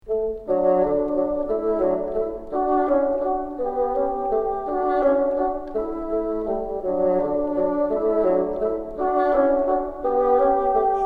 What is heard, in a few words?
together, bassoon